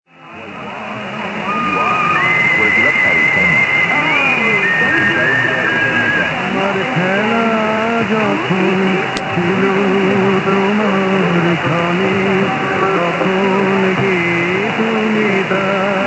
1503 Betar Bangla; sdr Amberg

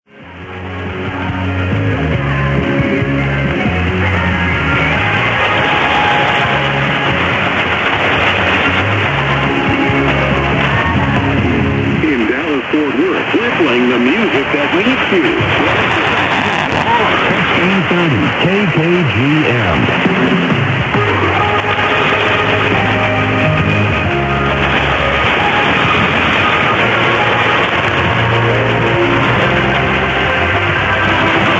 1630 KKGM USA; sdr Amberg

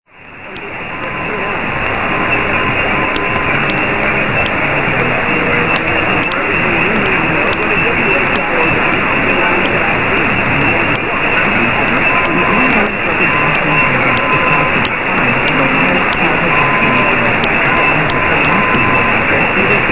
WHIO USA, 1290; sdr Amberg

